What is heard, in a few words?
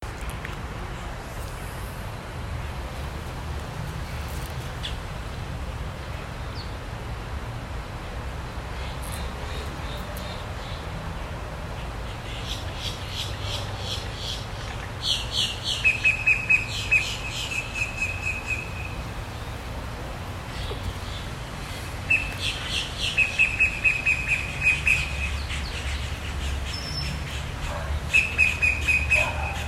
outside sound